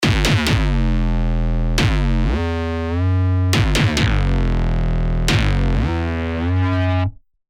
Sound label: 808 Hazmat; revealed serum 2 hard bass meltdown